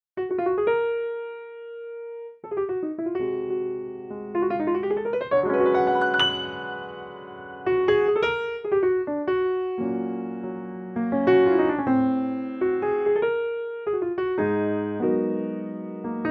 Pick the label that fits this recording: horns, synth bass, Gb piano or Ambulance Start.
Gb piano